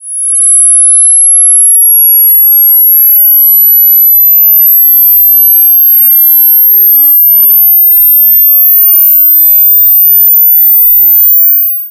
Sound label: Rise